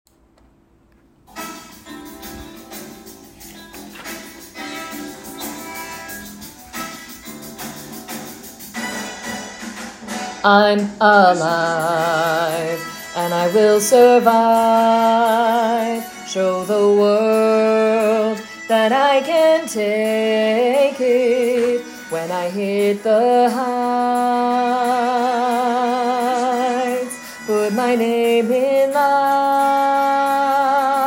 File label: Melody + Bass; Fame The Musical - Holiday Pack